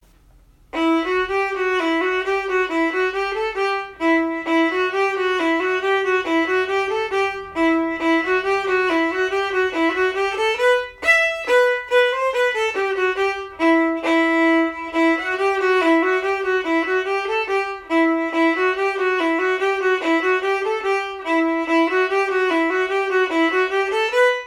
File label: viola cello version